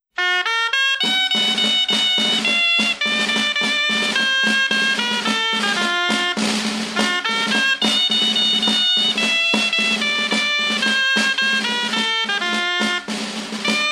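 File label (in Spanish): Baile de los cantaros, Bailes tradicionales en Valladolid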